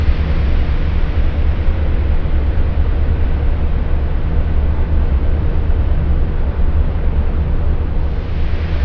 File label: interior; Counter Strike Model Pack; CS:GO; nuke